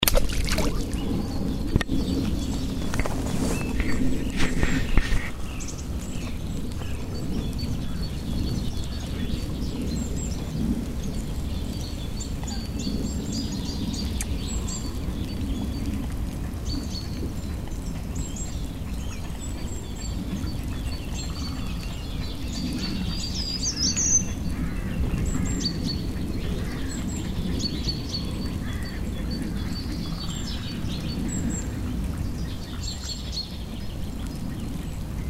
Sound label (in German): seegeraeusche